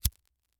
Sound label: Household Lighter Flick Light Fire